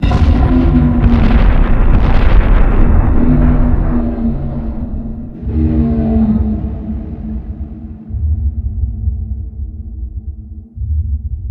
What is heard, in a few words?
end, enderdragon; mob; minecraft; PixelPerfectionCE